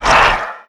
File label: grunt soft, antlion; creatures; vox